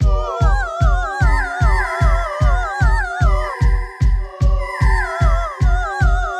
Clockwork Machines - Ethnova

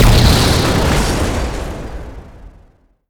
Boss Attack; Pac-Man Championship Edition 2 Plus

